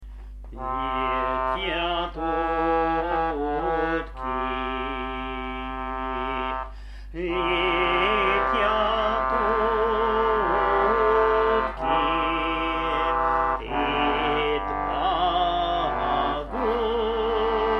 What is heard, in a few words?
Concertina; Lyetyat Utki